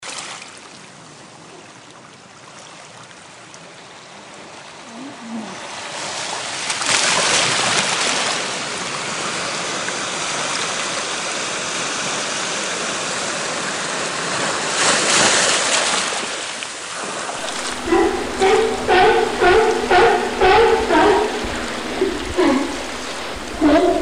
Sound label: Galapagos Birds Sounds